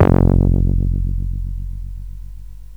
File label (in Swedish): vingelbas, Bass; Giga Samples Collection